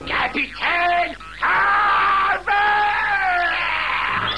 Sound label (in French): cri